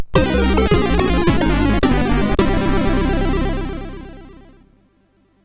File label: Robot Jet Action, MOS6581R4